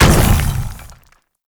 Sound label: Bowl Land A; Warframe; Grendel